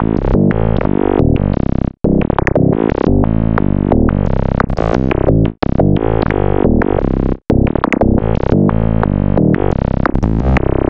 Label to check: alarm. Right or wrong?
Wrong